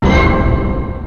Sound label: ORCH HIT; Kid Nepro - Elite